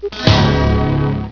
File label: CHORD; 999wavs